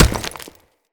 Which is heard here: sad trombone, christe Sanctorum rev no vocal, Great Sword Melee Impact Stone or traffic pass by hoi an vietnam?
Great Sword Melee Impact Stone